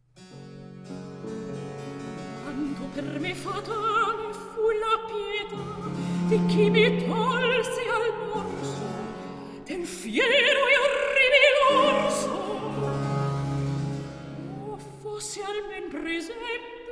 Recitativo; Ippolita, Antiope

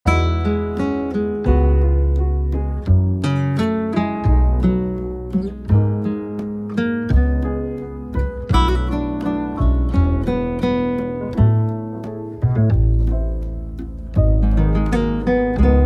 Instrumental Jazz Music Ambient

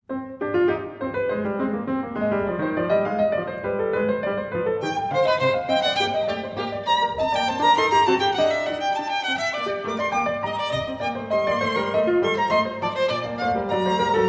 Sonate Für Clavier Und Violine, Allegro; Bach

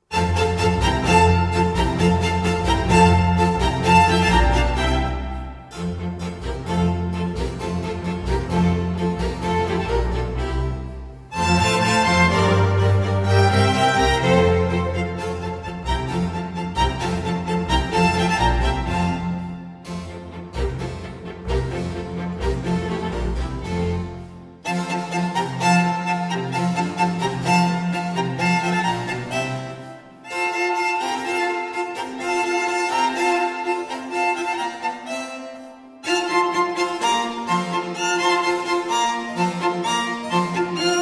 Violin Concerto in F Major, Allegro; RV 293, “L'autumno”

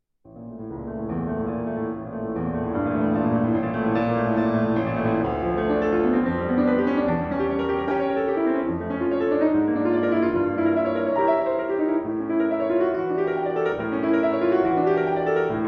Sonata For Violin and Piano in A Major, Allegro

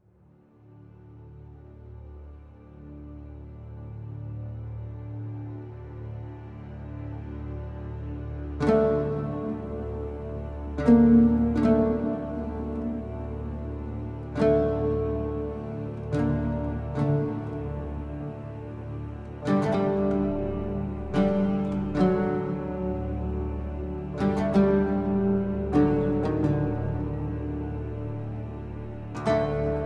Ambient Arabian Oud

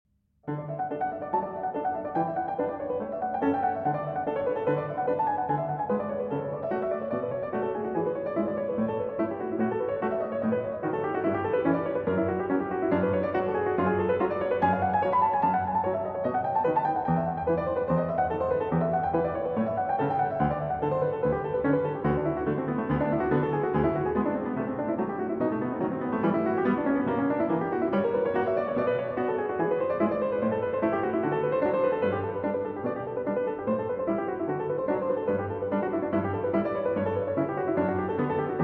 Prelude & Fugue in D Major, BWV 850 I. Prelude

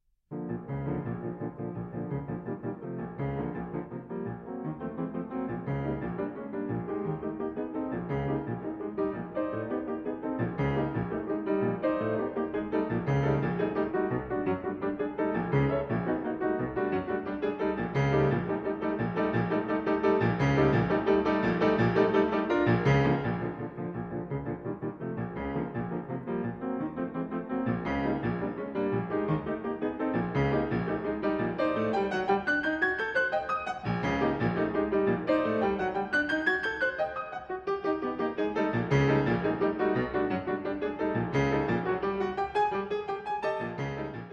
Piano Sonata in B-Flat Major; Op. 83 III. Precipitato

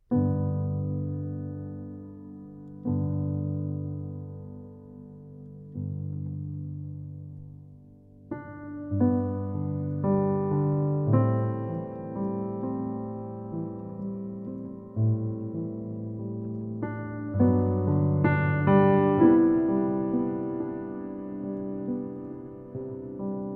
Piano Reworks

